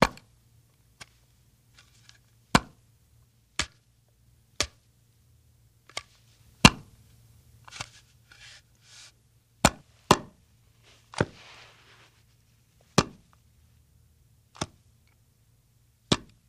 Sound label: Knives Wood